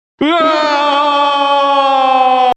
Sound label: lose; hoseong